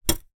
plastic nail brush down on surface brush end, household